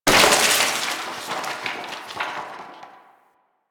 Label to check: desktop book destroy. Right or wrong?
Right